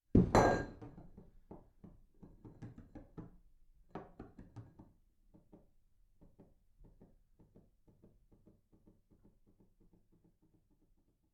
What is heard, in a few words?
glass drop and roll